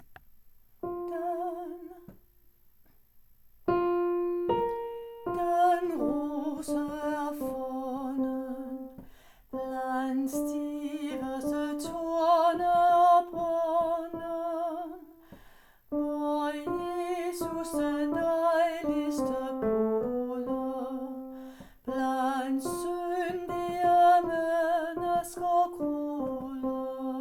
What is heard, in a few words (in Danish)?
Den yndigste rose er funden ALT